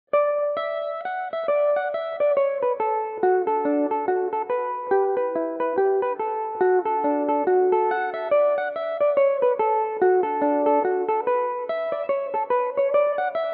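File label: reel D major, Irish mandolin; saint annes reel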